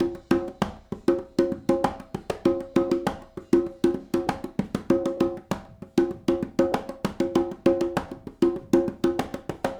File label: Smooth Groove Congas, 70s Analog Funk; Sonic Foundry (Sony Creative Software); What it Is!